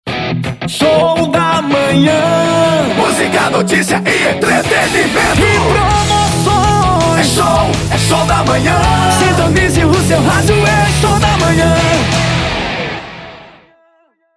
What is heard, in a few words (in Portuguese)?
vinheta showdamanha radio; radio cultura dos palmares; kzam studio